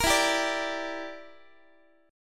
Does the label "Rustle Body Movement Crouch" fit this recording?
No